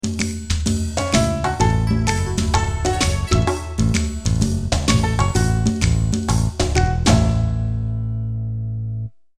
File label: bgm